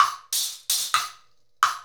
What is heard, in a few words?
Wood Block and Crasher, Essential Percussion